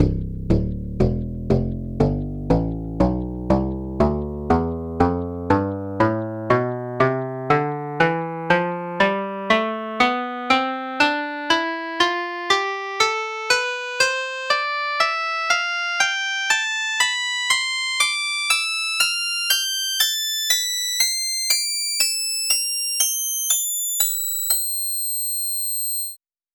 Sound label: koto tonleiter